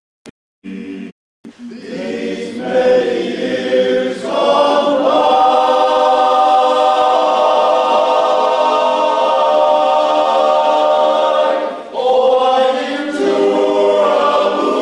Demo chorus

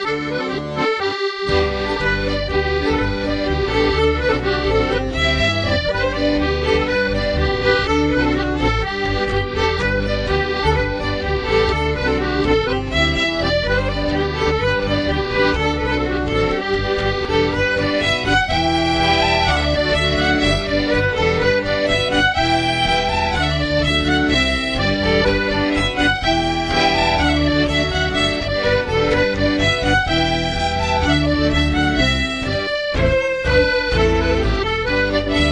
POLKAS

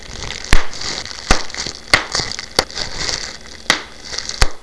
big bubble wrap

